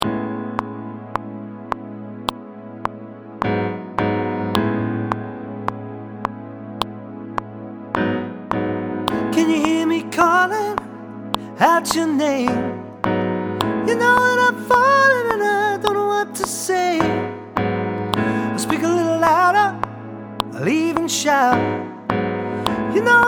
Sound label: Everywhere Sop